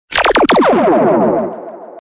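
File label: laser ray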